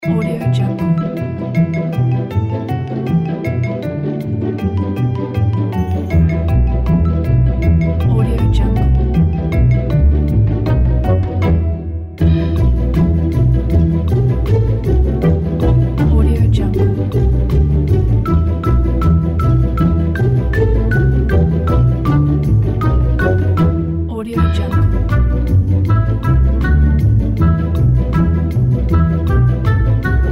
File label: comical music pizzicato